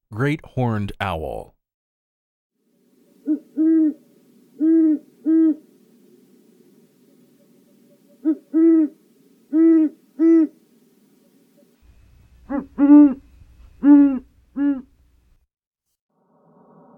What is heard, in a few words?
Great Horned Owl; Non-Game Birds